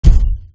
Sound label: menuhit; geometryrash